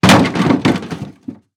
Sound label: Impact Door Breakdown